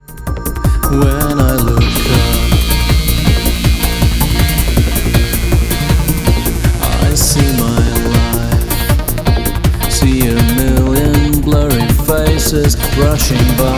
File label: electroshlager; CSugar